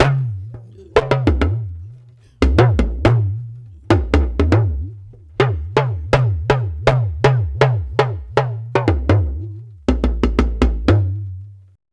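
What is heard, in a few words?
120 bpm, Ju-Ju Talking Drum Solo; Spectrasonics; Supreme Beats; African Contemporary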